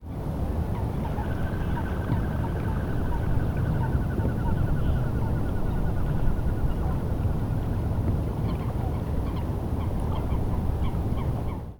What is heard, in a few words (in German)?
schellente